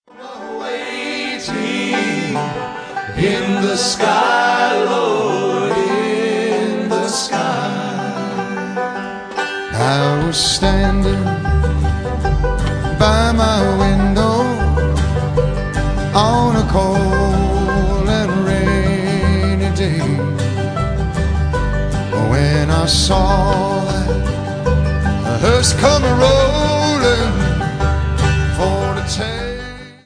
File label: Bluegrass Medley; Golden Anniversary